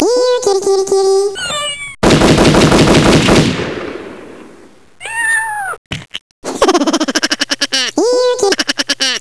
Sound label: kitty